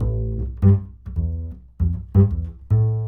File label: bass original